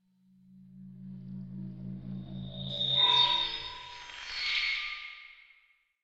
computer feedback

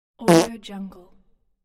fart button funny

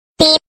beep

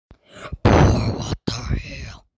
fart what da hell

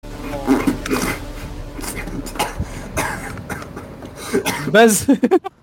choking welder

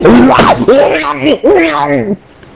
zombie ghost loud

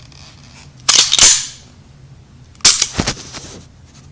gun sound effect

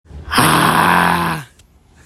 ahhh

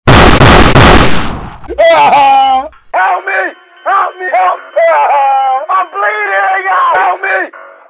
gunshot help me

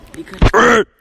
aaargh

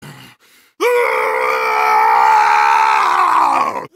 rraaahh

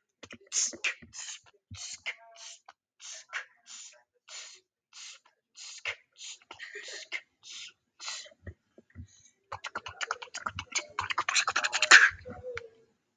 beat boxing

